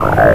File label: noise fx; pocket calculator 24